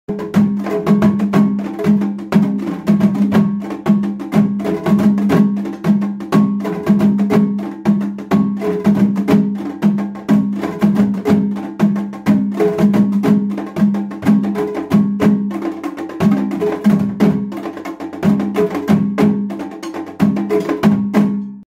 deserkoten

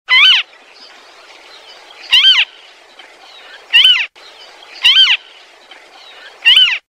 marsh harrier

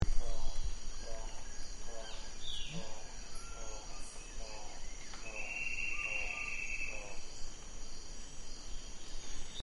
Gould's toucanet

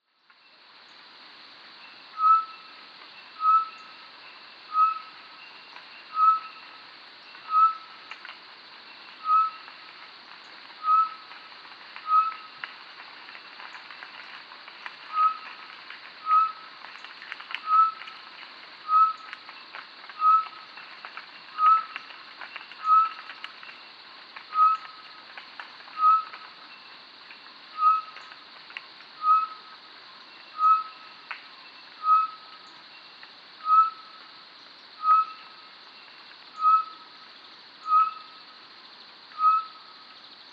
Batis-mixta, پرنده سیه رخ جنگلی

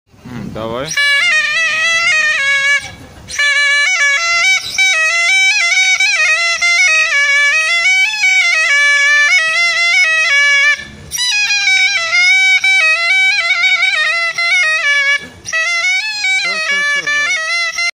Zurna

